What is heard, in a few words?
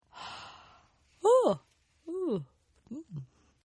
Yawn Female; Yawning; Partners In Rhyme